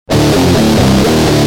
G Jam, Guitar; Industrial

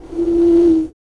BLOW, BREATH FX; Spectrasonics; Vocal Planet; Jazz FX

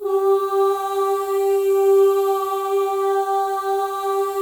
MOVING V1, Symphony of Voices; Spectrasonics

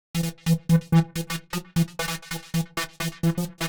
130 E, uk garage, Synths; 130bpm Lines n Loops

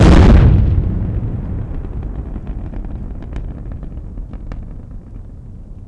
EXPLO

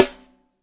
Yamaha DTXpress Acoustic Snare

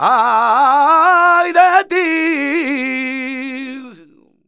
voc c, Flamenco Vocals Male Cantaor

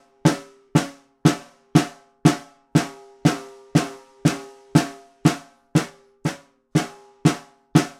Vintage Ludwig Snare, Percussion

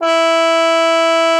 cup mute E4; Xsample VOL-3

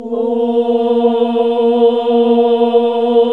OHH A#, Nuns Ooohh; NUNS AAH OOH; Sound & Vision - Gigapack II CD 1

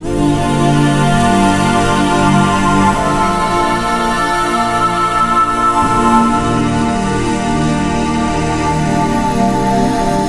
Gildenstern Dmin, Pads; Zero-G; Total Drum Bass

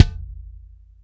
BD, Bassdrums, Roland TD-20

